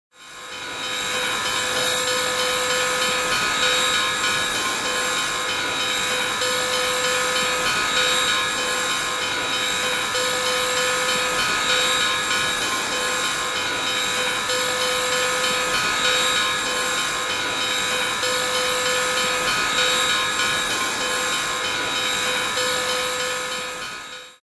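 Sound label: steam locomotive waiting bell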